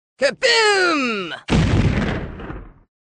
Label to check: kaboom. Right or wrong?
Right